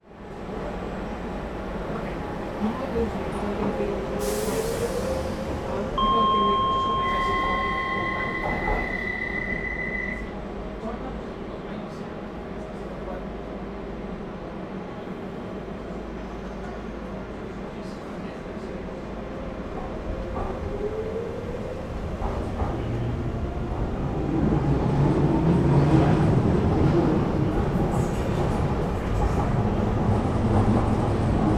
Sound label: transportation train DLR london traveling between stations